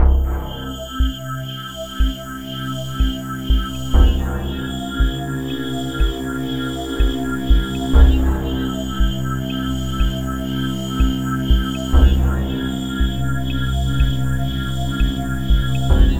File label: Weird Pulsing